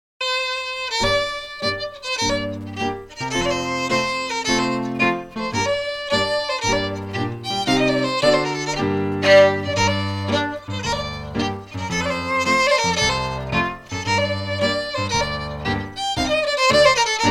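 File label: Strathspey, Scottish Fiddling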